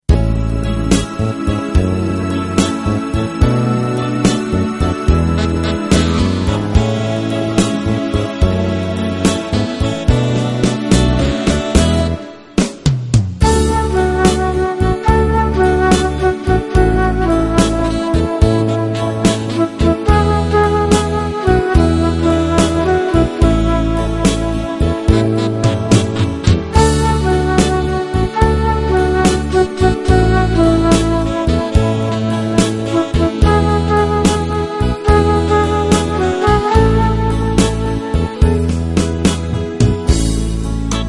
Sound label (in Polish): lilia biala instr